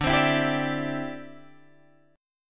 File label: D 9th Suspended 4th D down Guitar Drop D